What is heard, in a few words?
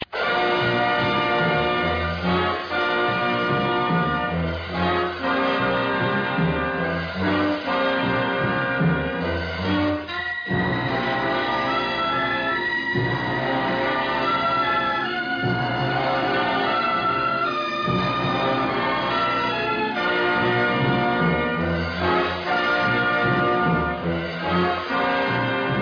tattoo